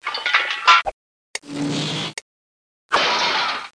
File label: Laser Lab Sound; Zap!; EdMark Corporation